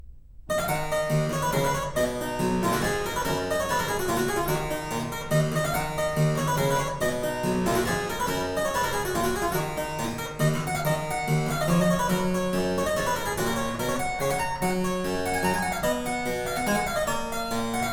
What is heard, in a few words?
Hornpipe In E Minor, Harpsichord; Purcell edition